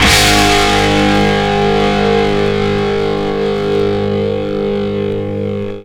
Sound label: INTRO guitar; Big Beat Samples & More; AKAI